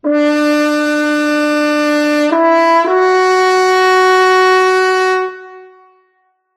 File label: Mute Forte, the french horn